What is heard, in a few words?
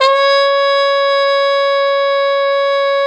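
brass plunger trumpet, plunger mute; Plunger & Wa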